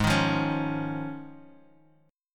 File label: D sharp 7th Suspended 2nd Suspended 4th G sharp